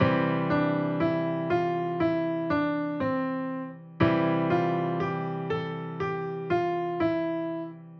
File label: piano